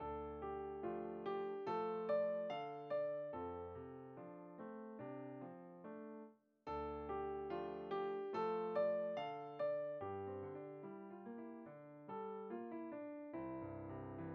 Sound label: Piano; Vietnamese Arrangements